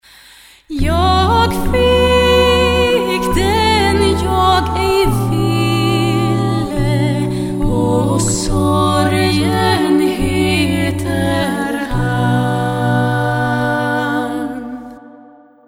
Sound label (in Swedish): musik